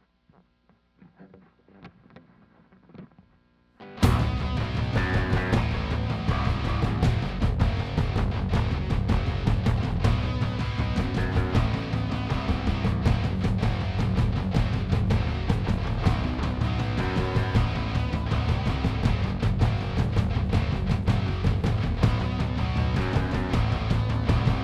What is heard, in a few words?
Drum Stems